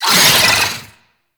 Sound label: Brood Cast Vocal; Warframe; Oraxia